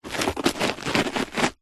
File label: footstep single boot scuff on dirt track grit stones